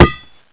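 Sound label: metal pipe, Prangs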